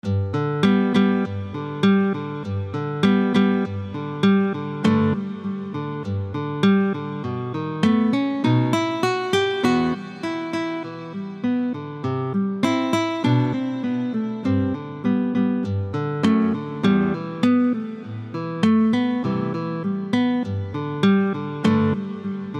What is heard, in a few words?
guitar; guitar pro 3